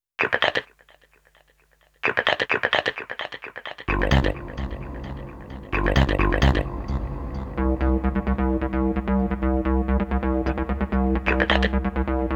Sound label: vocal synth